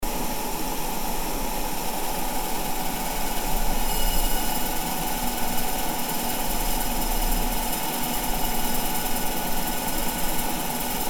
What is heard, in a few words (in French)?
helice